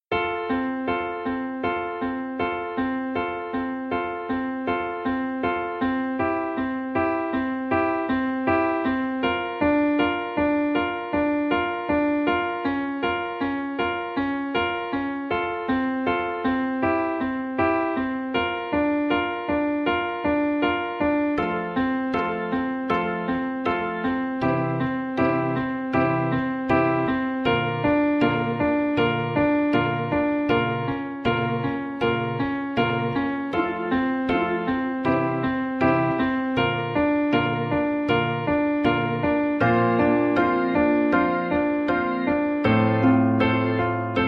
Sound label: ACCOMP